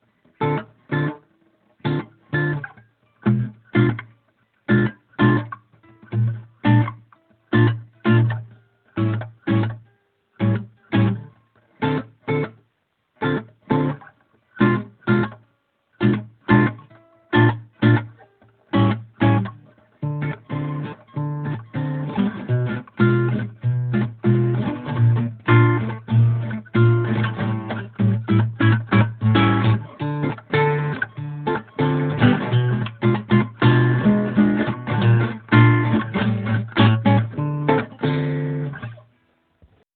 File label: Bossa guitar